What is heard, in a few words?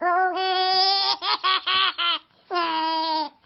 peekaboo, baby